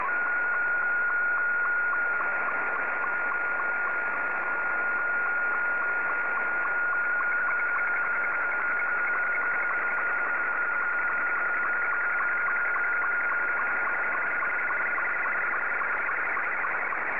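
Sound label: Scottie 2, slow-scan television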